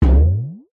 cartoon impact hit drum ascending tail; zapsplat

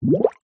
nature water bubbles rise surface small fast

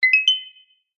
multimedia pop up chime short high pitched; zapsplat

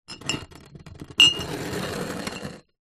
foley glass bottles x2 slide on dirty table

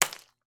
ice chunk small impact surface break; sound effects one

